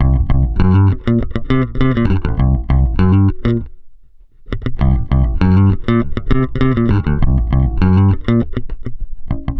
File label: COOL JZ 100, Bass Grooves; USB Soundscan vol.30; AKAI